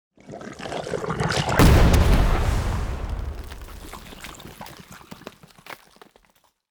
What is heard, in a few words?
muddy ooze explosion; spells; michael ghelfi